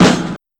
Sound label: 00s puffy snare one shot f key; snare drum samples